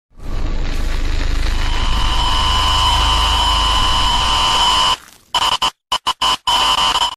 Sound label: slenderman sound